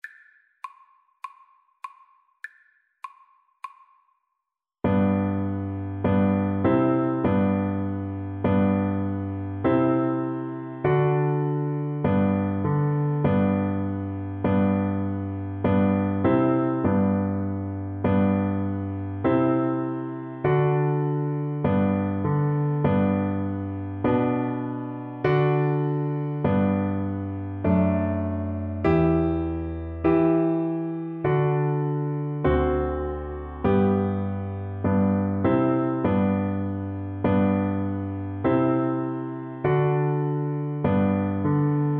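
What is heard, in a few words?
recorder karaoke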